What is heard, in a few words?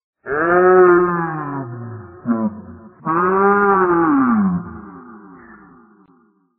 sleep